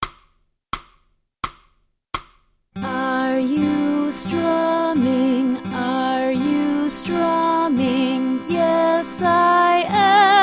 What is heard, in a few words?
guitar for kids; hal leonard